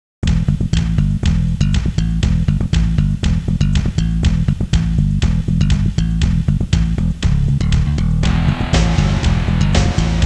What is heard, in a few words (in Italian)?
suonerie musica ritmica